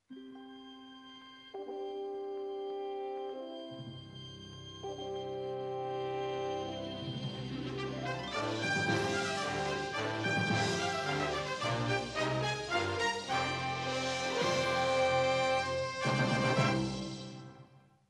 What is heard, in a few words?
fanfare